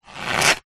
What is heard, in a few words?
cartoon squeeze tight gap; zapsplat